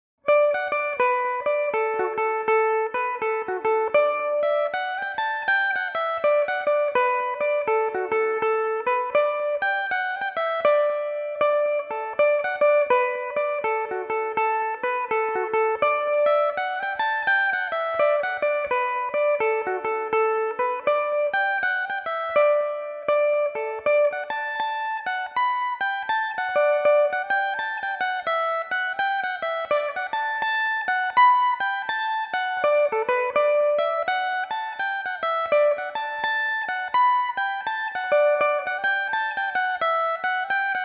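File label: D major, Irish mandolin; No Surrender; Belmuse EM-190 electric mandolin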